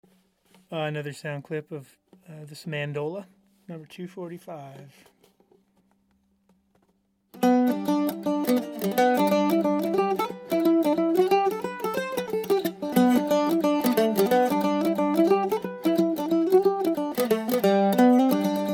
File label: mandola vstyle oval